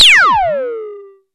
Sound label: SPACE DRUM; Rubber Chicken Software Sample Bank; DRUMS + PERC